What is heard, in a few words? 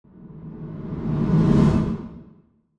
Snare